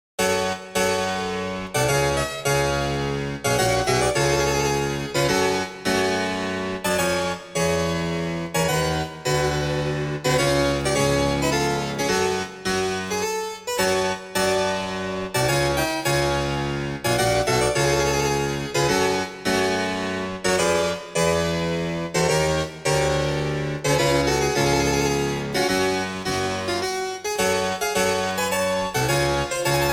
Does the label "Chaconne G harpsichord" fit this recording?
Yes